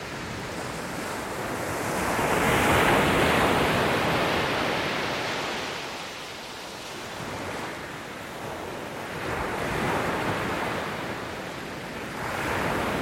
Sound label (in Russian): nabegayushie na bereg morskie volni